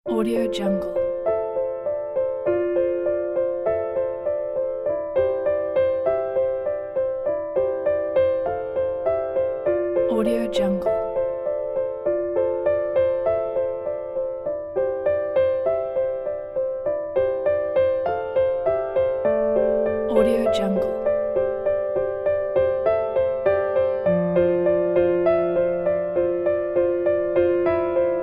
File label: air